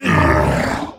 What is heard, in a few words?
Block UV Light; Devour; The Town; devour 2021 gamerip pc macos